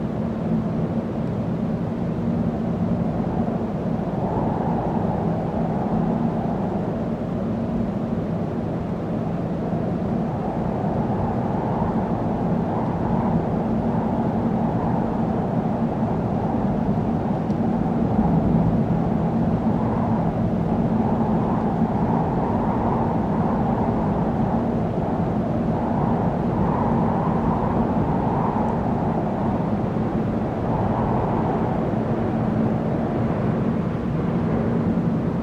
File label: Wind canyon